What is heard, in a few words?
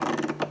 crank; doctorwho1200